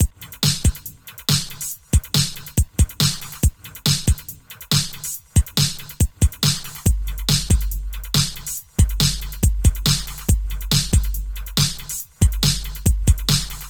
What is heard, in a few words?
Loops BB 140, BreakBeats; Vengeance Samples